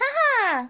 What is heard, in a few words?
haha; toadette; sm64coopdx; char select extra chars